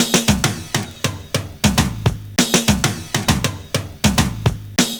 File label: FILL, LOOP; Univers Sons - Kitch 70s